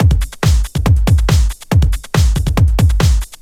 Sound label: Loops BB 140, BreakBeats; Vengeance Samples